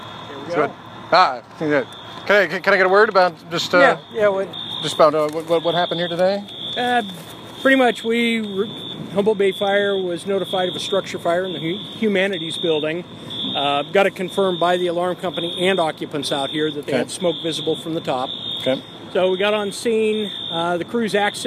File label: fire